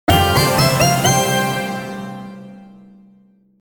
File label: jingle bright